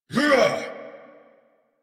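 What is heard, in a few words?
Ability Exert; Warframe; Veilbreaker; Kahl Barks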